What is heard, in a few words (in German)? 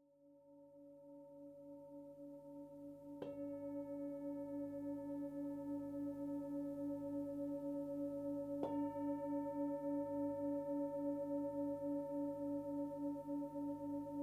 Hörbeispiel Klangschalen